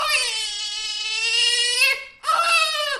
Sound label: scream female; Horror